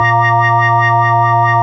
ORG VOX A#, Continental, Vox Wah Bar; Roland LCDP09 Keys of the 60s and 70s 1

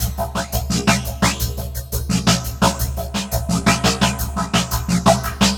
Drum Loop, Drum Loops

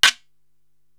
Guiro Short

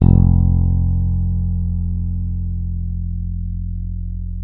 F1, FINGER MUTE; Xsample VOL-1

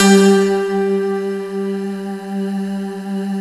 JV-1080 BELLS PNO; Syntec; Wall of Sounds

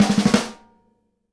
Live Snares

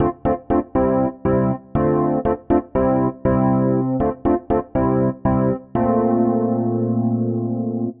Chord El Piano Dm, 120bpm; Zero-G; Extra Chord Progs

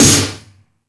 Percussion FX, Drum Machines

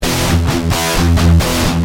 Guitar Heavy, Industrial

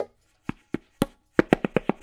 Tabla

